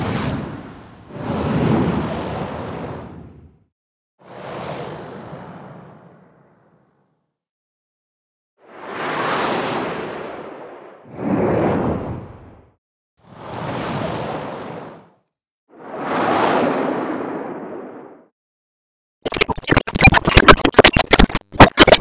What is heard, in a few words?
Whooshes; Cinematics; Warsongs; Warsong Prologue